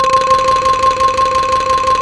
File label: BAMBOU C5